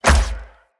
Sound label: Hunter Bow Ranged Shot Punch; Weapons; Player; Envoy; Bows